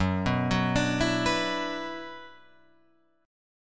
Gb-7th Suspended 4th Sharp 5th-Gb-6,6,6,9,7,6-8-down-Guitar-Open F; arpeggiated; JGuitar